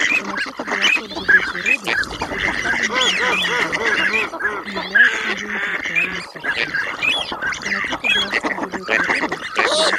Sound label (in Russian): Базар утиный; GERMANO MISTO